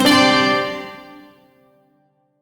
Shawzin Pent Maj Chord L; Warframe; Duviri

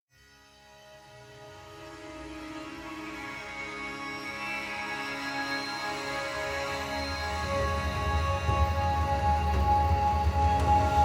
Silent Rose Hub Ambient, Music; Hubs

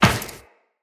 Run Metal Perforated, Footsteps; Characters; Tenno

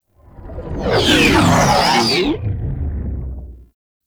Sentient Ship Close Fly By, space fighter; Warframe; RailJack; Enemy Ships; Coil Agent

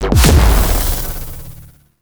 Alt Proj Explosion; Crp BE Plinx; Blastor Pistol

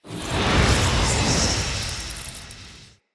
Casting Device Charge Heavy; Fenn

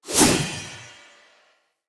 Spear Throw; Weapons; Player

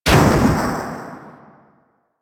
Super Weapon Bomber Explosion; Warframe; Caliber Chicks

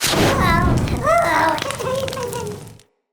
Molten Mote; Motes

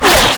Infested Runner Arm Attack D; Enemies; Abilities; Warframe; Proto Infested

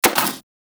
Rifle Mech Alt; Thanotech

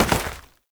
Step Dirt, Foley; Warframe; Oraxia

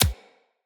Bullet Impact Hit Notification Two; Weapons

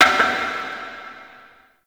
Metal Debris, Ambience; Warframe; The New War; Zariman

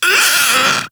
Vocal Pain, Infested; Splitter Split Top; Grey Strain

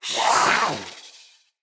Feral Vocal Attack Medium; Catbrow

